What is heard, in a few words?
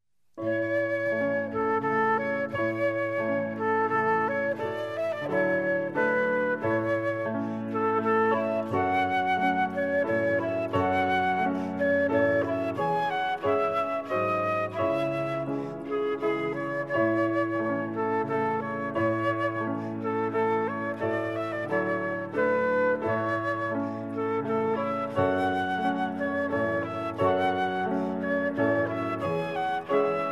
Waltz, Flute; Brahms